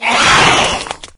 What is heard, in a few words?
attack; doom3